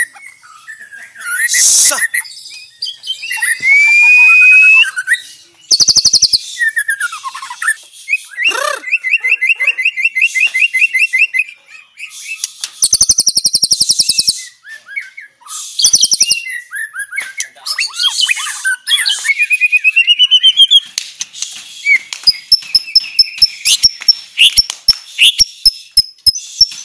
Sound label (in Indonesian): Suara Murai Malaysia